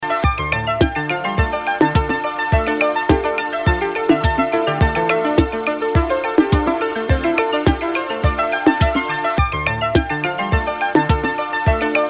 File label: ELECTRONIC